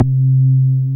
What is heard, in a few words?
BAS MINI, Mini-Moog